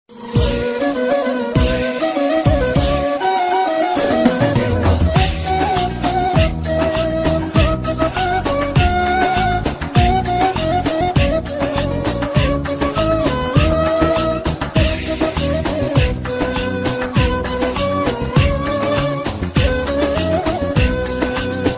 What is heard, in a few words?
Islam Ilahi Telefon zil sesi Phone ringtone